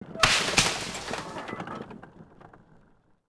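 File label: Artillery Run End Forest; Civ4 beyond sword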